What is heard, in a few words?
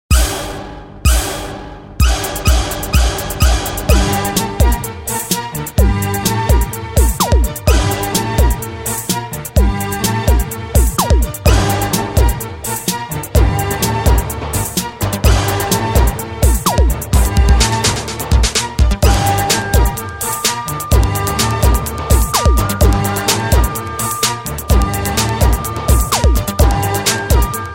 Pure Bass